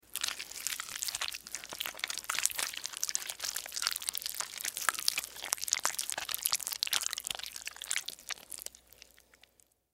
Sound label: Horror Guts Squish Insect Bug Foley Slime Juicy; Horror Body Reanimate Juicy Squishes Long; Blastwave